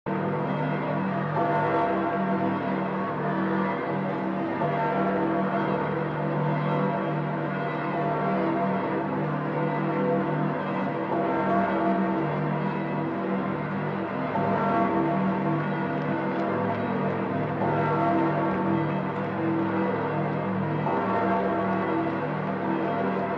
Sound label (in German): glocken st. peter